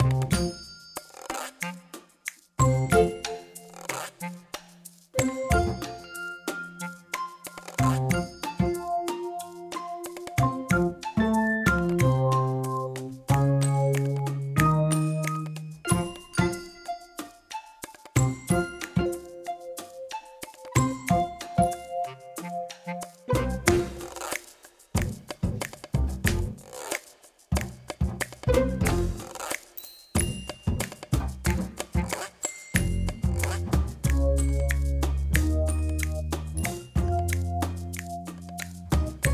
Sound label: Playground Main Loopable 185bpm; Unspottable; Xbox Series X|S